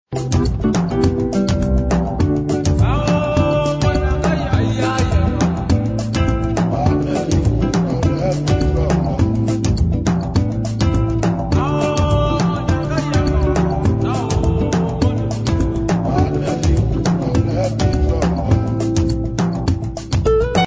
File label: African Slow